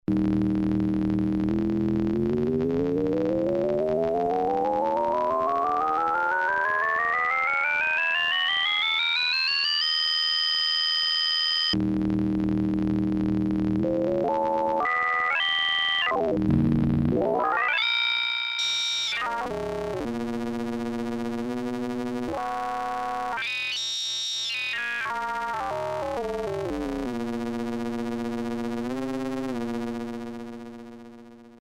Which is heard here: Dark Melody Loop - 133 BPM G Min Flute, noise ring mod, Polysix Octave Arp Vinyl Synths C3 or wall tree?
noise ring mod